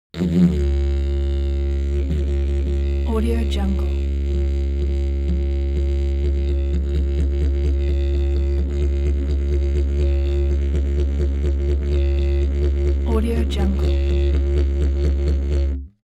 musical sequence didgeridoo single tone sequence long